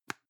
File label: plastic can lid screw close soft dry; objekte; dose